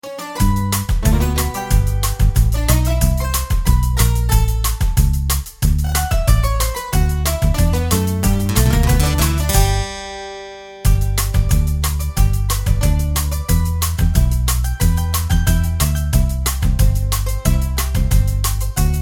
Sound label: G No Rhythm No Backing Vocals